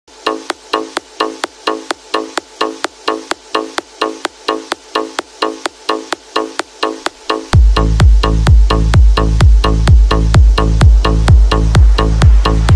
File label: dance bass music bounce remix